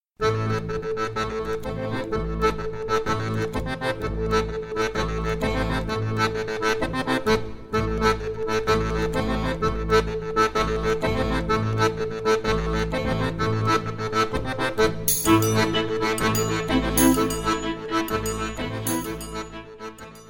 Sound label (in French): Maclotte de Steinbach, Belgique